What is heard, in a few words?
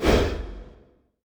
tower arborean emissary basic attack